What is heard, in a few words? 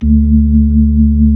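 B-3, Hammond B-3 organ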